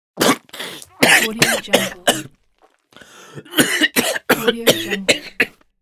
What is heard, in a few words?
choked